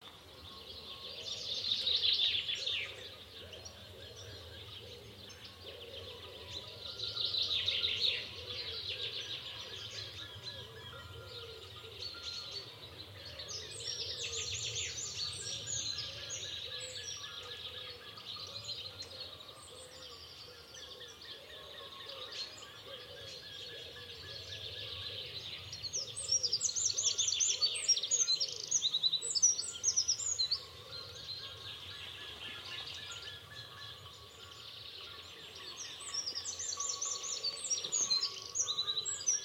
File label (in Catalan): Biofonia trenc dalba Cases menut